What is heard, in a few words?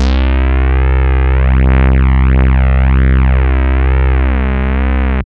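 BRS C2, Roland MKS-80 synth brass; Pads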